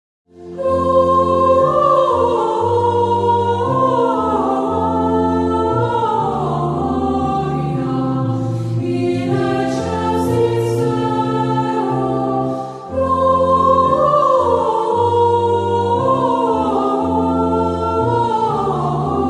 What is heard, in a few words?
Angels We Have Heard On High